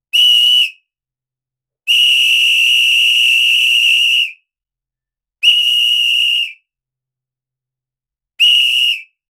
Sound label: sharp referee whistle blo